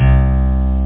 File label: accgit; da capo vol1; guitar